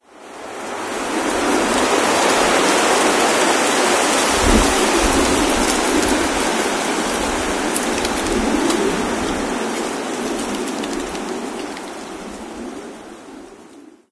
leaves rustle; ambient; Left 4 Dead 2; Source Engine; random amb sfx

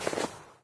snow, footsteps

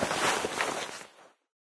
taunt surgeons squeezebox draw clothes; video games; source engine; tf

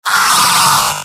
pain sharp; demoman; video games; source engine; tf; mvm

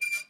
squeak; ambient; machines; Source Engine; cstrike

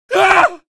pain, male; video games; npc; source engine; episodic; episode 1

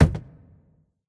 phys cube, physics

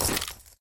pda equipment light; video games; player; source engine; tf

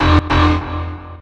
cpoint klaxon; video games; source engine; tf; mvm

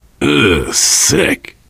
reaction disgusted, biker, voice; source engine; left 4 dead 2

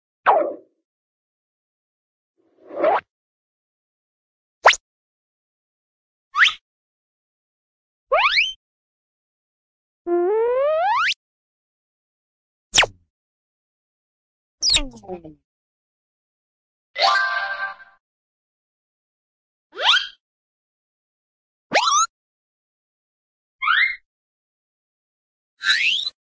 zip, cartoon keen zip out

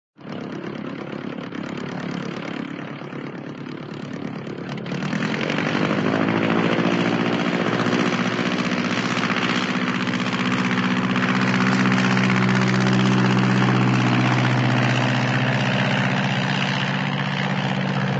airplane, prop bleriot take off; cartoon

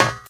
metal, footsteps, walk

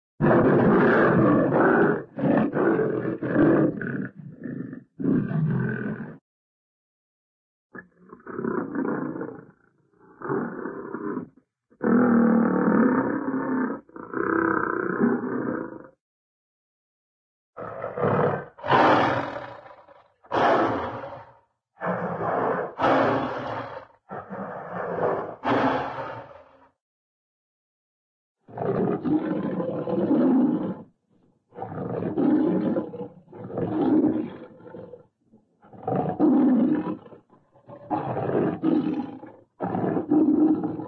tiger loud growls, animal, cat; cartoon

